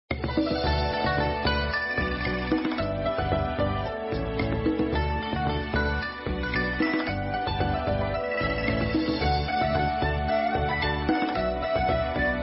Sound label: bgm